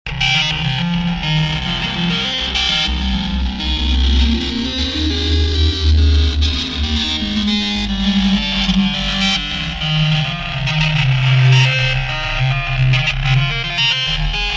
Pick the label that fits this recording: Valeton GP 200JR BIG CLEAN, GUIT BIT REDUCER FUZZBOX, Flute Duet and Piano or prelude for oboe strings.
GUIT BIT REDUCER FUZZBOX